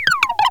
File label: backpack end; SFX backpack Godboxv2